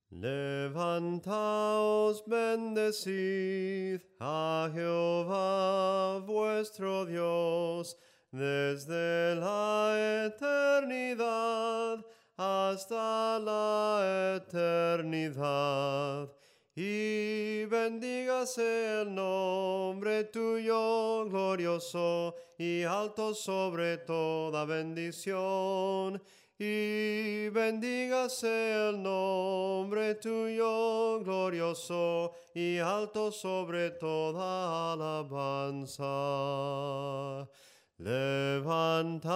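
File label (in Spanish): SOPRANO CANTADO, himnos en melodia soprano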